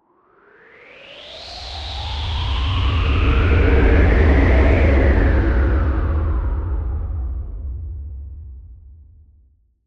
Space Craft Whoosh, Windy, Airy; Sci-Fi; BlastWave

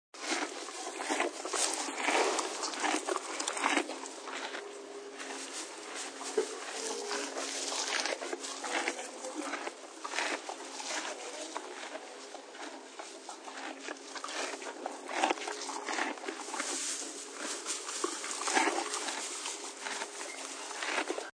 Hrs Chew, Horse chewing hay.; Horses; Animals

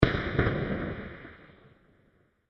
Bright Big Explosion Followed By A Small One; Explosions; CraftedSignals

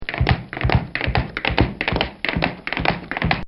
Tap Dance Steps

